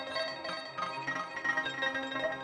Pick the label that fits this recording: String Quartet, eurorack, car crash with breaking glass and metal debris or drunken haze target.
eurorack